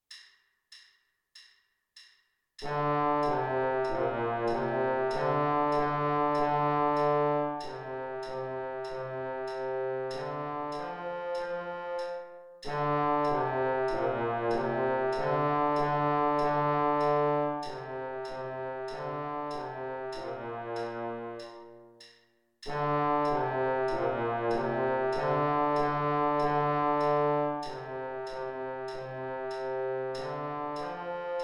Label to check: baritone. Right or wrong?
Right